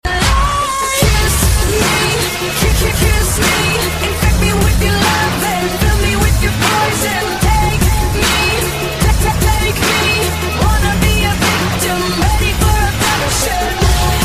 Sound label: English